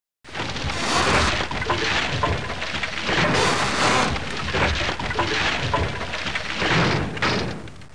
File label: sink